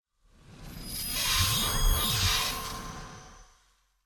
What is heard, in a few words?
Portal Appear; children of morta